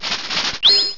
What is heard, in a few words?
Coil; pokerogue; battle anims